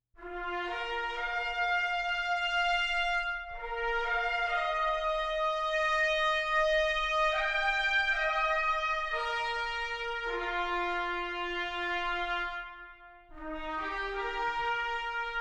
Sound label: Brass Fanfare; CineBrass Core; Copland; Cinesamples; Kontakt